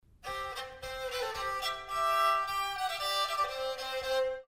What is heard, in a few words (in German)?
Rebec Klang